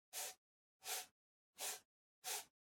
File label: PC Mouse Move Far Soft Pad Short